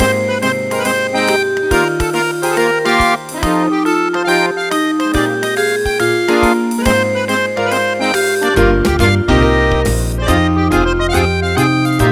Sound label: Humorous, ringtones; ZTE; V790 KIS II T-Mobile